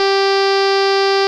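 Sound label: OB8 organ C3, OBERHEIM OB8; Big Fish Audio - First Call Vintage Keyboards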